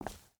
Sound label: footstep hide concrete walk; Rust